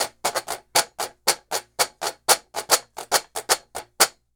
Washboard, Appalachian; Sonic Foundry (Sony Creative Software); Continental Drift Aftershocks

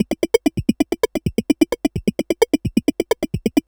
Juno-60 Arp, 130BPM

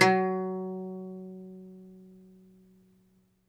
OUD F#2, Plucked Strings; E-MU Producer Series Vol. 7 – Old World Instruments

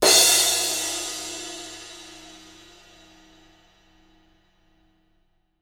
sfx perc

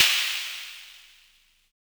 Snare, Univox SR-95

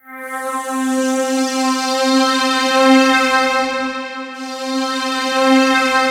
C3, SynthLine

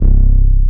BASS -L, 168 BPM Drum & Bass; Ueberschall; Drum & Bass Resonance

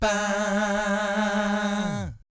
VIB FX, Vocal Planet Jazz & FX; ILIO; JAZZCOMBO

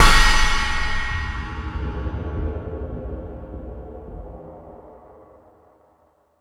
Metal Drums, E-MU Pro-Cussion

